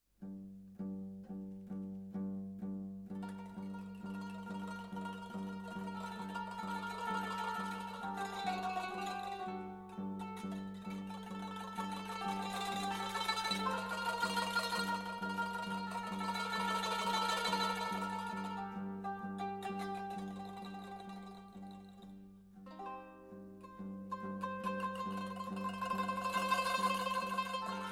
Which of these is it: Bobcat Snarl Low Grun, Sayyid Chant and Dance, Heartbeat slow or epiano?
Sayyid Chant and Dance